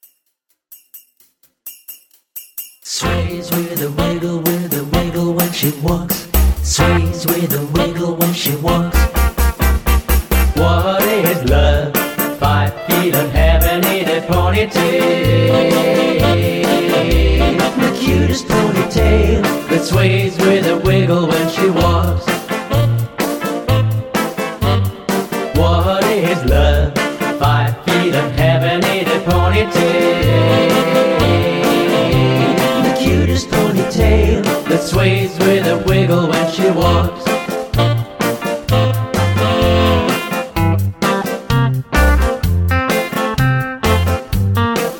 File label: High Key